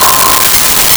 Vibes C6; Nintendo; July 2020 Gigaleak

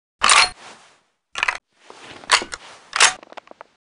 reload, assault rifle

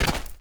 dirt land, footsteps; chief

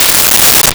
China Cymbal; Nintendo; July 2020 Gigaleak

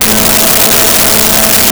Cello A3; Nintendo; July 2020 Gigaleak

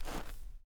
dirt jump, footsteps; chief

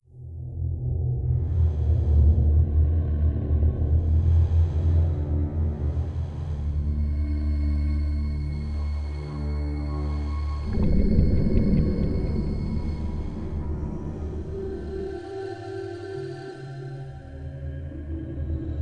spooky, music

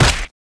goo punch, melee hit

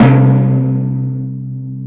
Timpani, c3; BGM; Nintendo; July 2020 Gigaleak

